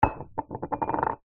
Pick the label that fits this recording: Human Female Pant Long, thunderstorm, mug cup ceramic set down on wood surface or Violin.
mug cup ceramic set down on wood surface